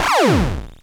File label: fire; daphne